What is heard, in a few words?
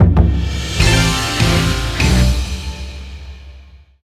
Short Draw; Naruto to Boruto: Shinobi Striker; PS4